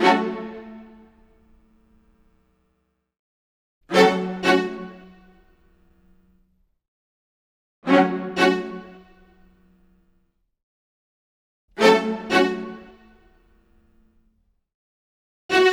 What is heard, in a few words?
Strings Stab; Sonic Foundry (Sony Creative Software); Club Joints and Street Anthems; Razor Edge